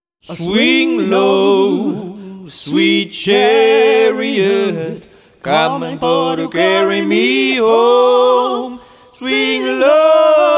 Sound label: Swing Low Sweet Chariot; bell meets hammer